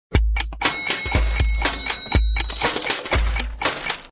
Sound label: decide gamble; games; poker; American Poker The Legends II